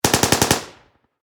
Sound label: Warfare Machine Gun 7.62 Caliber M240B Long Burst Distant Perspective